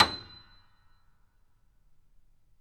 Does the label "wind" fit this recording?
No